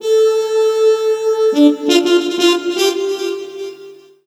sax, Roots Funk; USB Soundscan vol.41; AKAI

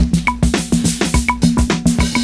PERCS, 70 s Breakbeats

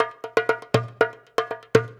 120 CONGA; Best Service Advanced Media Trax Modern Composer

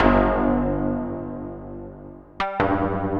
Kling Klong, Synths; Sonic Foundry (Sony Creative Software); Modular Electronica

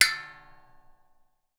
waterphone

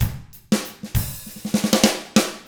95 FUNK, Drums; ILIO; Double Platinum Drums 1

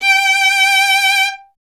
STR VLN, Vln Detache; Miroslav Vitous

